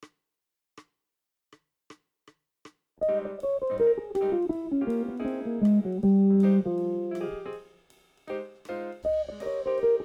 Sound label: Solo Break (medium)